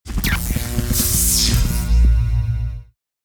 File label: Stereo Laser, notifications; Motorola; Edge 70 Max China